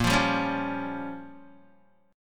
A sharp Minor 6th A sharp down Guitar, chord; strummed; JGuitar

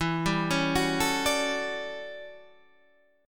A 13th E, guitar chord

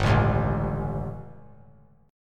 G Suspended 2nd Flat 5th G, chord; Gsus2b5 strummed; JGuitar

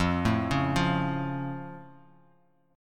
F Augmented, Bass Standard; arpeggiated; 13,12,11,10; down

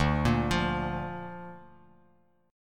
D Minor Sharp 5th D down Guitar Open D

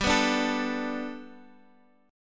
G Major Add 9th G down Guitar DADGAD

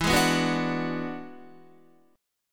E 9th E down Guitar; E9 strummed; JGuitar

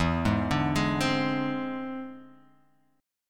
E Augmented 7th E down Guitar Half Step Down

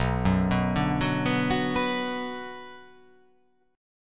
B Minor B

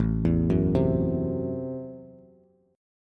A Minor 6th A Bass; Am6 arpeggiated; JGuitar

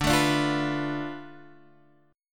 D Minor 6th

